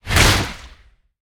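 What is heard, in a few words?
magic explosion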